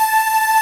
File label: Ending loop